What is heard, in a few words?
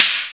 SNR NOIZ, snares; Roland L-CDX-01; SNR Snares 3; SNR Sn Modules 3